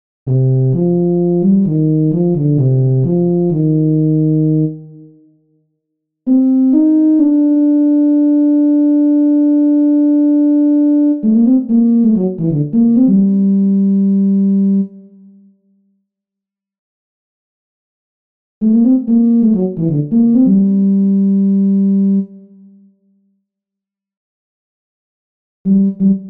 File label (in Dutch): Euphonium